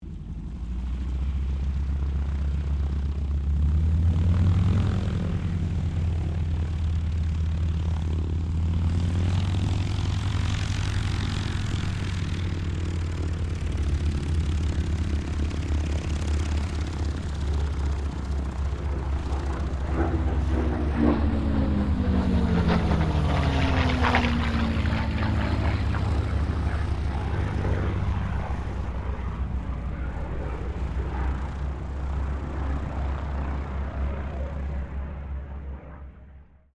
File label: AIRCRAFT AT-6D HARVARD MK III TAXI MUSTANG FLYOVER; Temora Harvard MK III; Airplanes (Sound Effects:Vehicles)